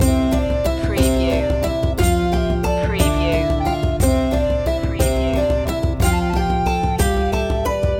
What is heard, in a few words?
medieval dance loop